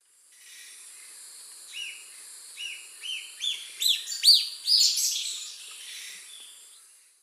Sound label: Pipra erythrocephala, call; S. America; Eastern Lowlands